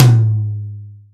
long tail reverb tom sound a sharp key; tom drum

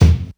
focused kick drum e key; tuned drums e key

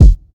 original kick drum single hit f key; kick drum samples

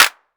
clap single hit f key; percussion

